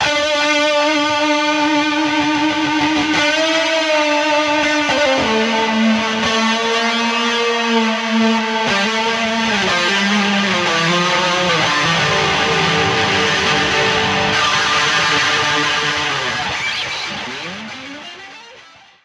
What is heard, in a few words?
Metal Solo